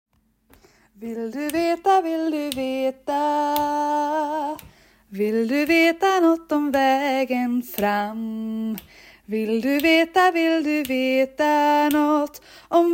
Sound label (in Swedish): vill du veta alt; stambank